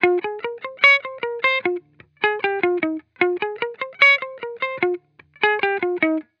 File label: Hip Hop Guitar Loop - 150 BPM F Maj; Cymatics; Vibrations Guitar Sample Pack